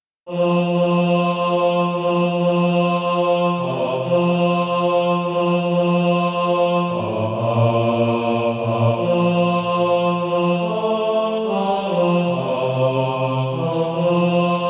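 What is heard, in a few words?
bass, Practise Music; From Choirs Redeemed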